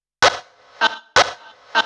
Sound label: House Loops, Vocal Loops House 128BPM